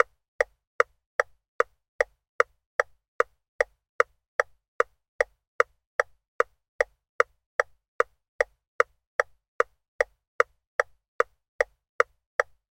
transportation car indicators hazard warning tick tock